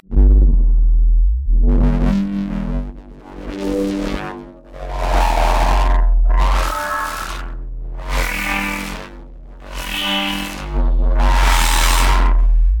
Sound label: D Swelling Distorted Synth Bass